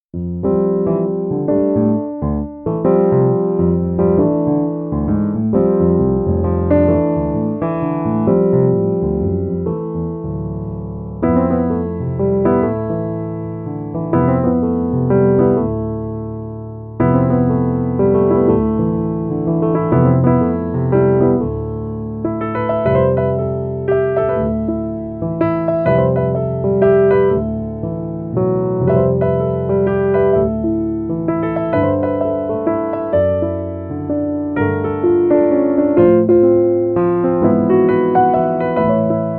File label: Waltz